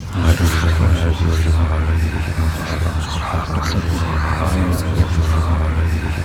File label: MURMUR; MURMURING